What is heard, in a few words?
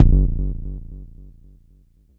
Mod bd, Jomox Airbase99